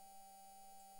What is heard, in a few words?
mic, mars2020 edlcam